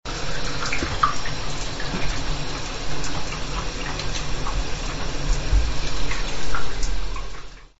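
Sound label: bath; huro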